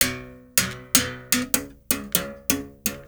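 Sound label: BS BERIM GRV, BERIMBAU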